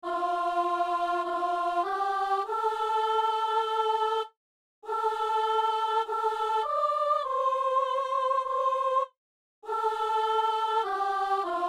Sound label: soprano; Dieu est amour; A trois voix; Messe du 17 janvier à Saint-Denis